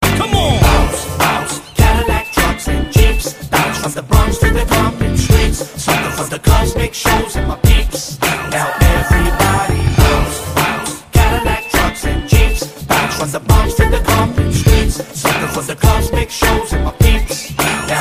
bounce

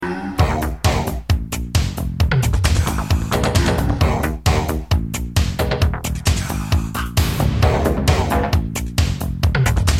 classic 80s movie

